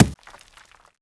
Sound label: gravel hit; projectiles; bullethit; Max Payne